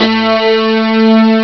d50 guitar mid, Amiga Soundtracker Sample Packs

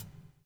BUBL; Spectrasonics - Backbeat

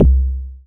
TOM, Yamaha TX7 synth drums

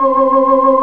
Organ, Barton Tibias

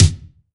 BD, BASS DRUMS; EdgeSounds

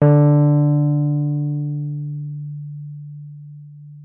WURLITZER, D3; Xsample VOL-1; 90 s Sample CDs; WURLITZER RS

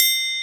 Triangle Open f; Digital Sound Factory Virtuoso

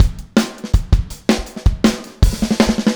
Fill Full Mix, Drums, 162 BPM; Drums from the Big Room The Mixes; Take Your Toms Off

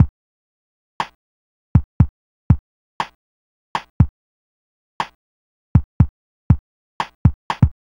Apple II Chill Beat, Drums, Chiptune; Sonic Foundry (Sony Creative Software)

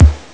bass drum; Amiga Soundtracker Sample Packs

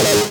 SYN DANCE; Sound & Vision - Gigapack II

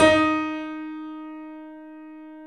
Small Pianos, 3-D Audio Collection

